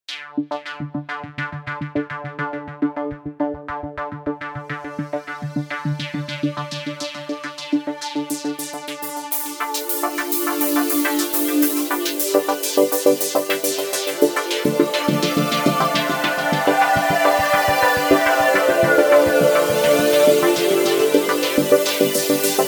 Motion Sweep; montage

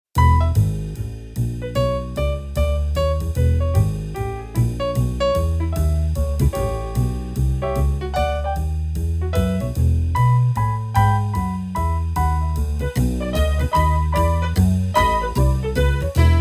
Bass Ride Cym piano; Montage

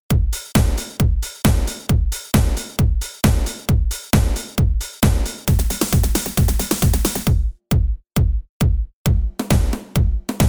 Premium Dance; live instruments

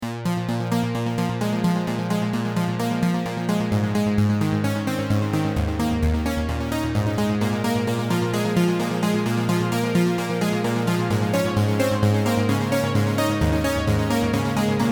Soft Eighties, phat analog

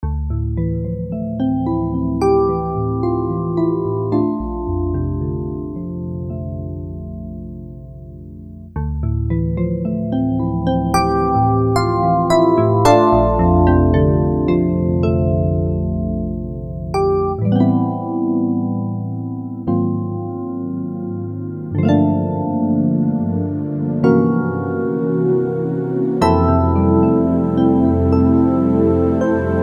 fm-x dream ep; Montage

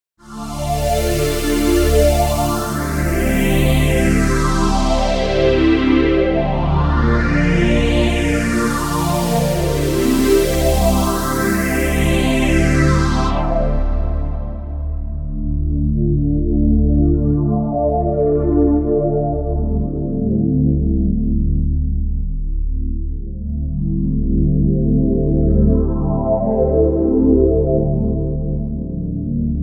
Fazer Pad

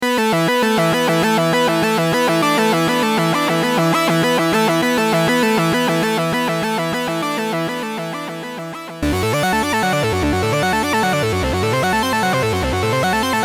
LIVE Progr Lead synths; live instruments

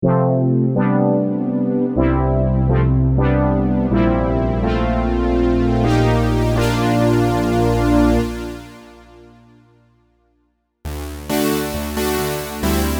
Phat Analog; Montage; PhatAna2 MPP Layer

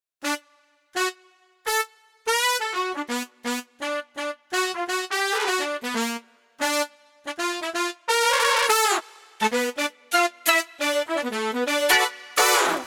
Modern Jazz Horns; montage; brass

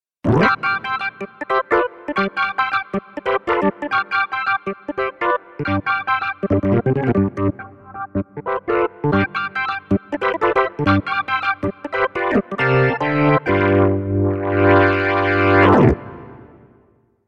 Rock Live

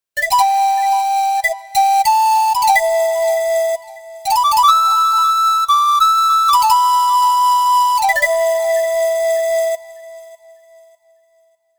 Panflute; montage; flutes

